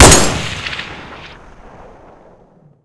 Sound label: svd fire; firearms